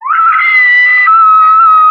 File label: scream